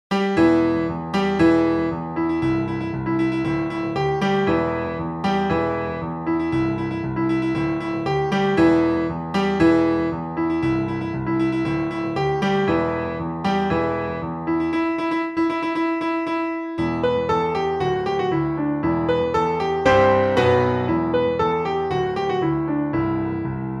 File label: adv, piano; KGF 2